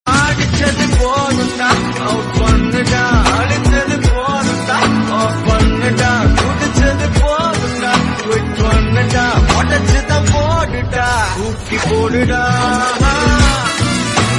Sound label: Tamil Song Ringtone